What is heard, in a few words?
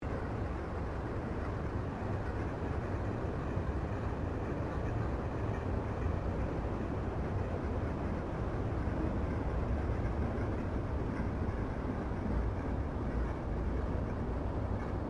Created Ambience Organic Shunting; Loop; Various Ambience created through sound manipulation in FMOD; Artificial